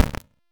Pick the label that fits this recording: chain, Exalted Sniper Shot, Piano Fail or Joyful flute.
Exalted Sniper Shot